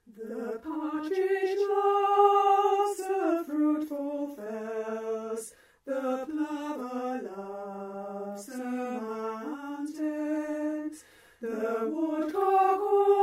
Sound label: Westlin Winds SSA; now westlin winds